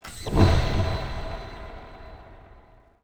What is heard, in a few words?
confirm echo